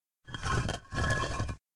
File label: Deep Rumble Walls